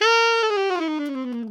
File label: ALTO LNG FL A#3, Sax; Giga Samples Collection; ALTO STEREO